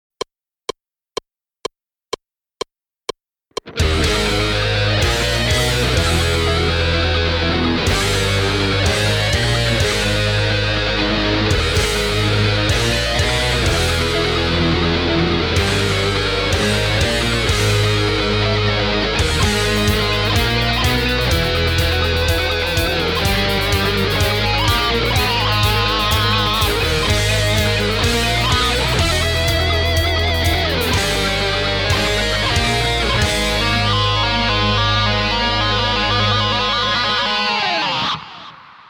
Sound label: WAH